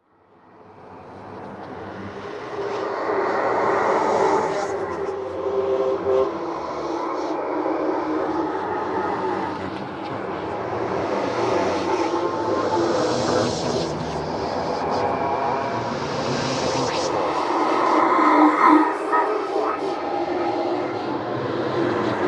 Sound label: voices ghosts; Sonniss GDC